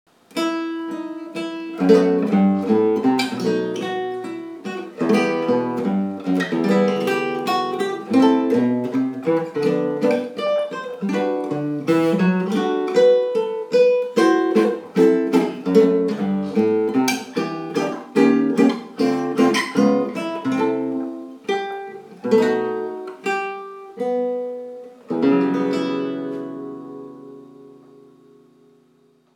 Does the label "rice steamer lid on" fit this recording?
No